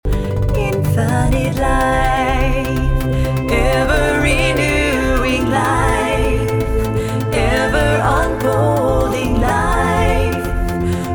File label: Life Chant